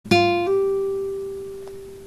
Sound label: hammer